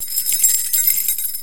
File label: JINGLING; AWE64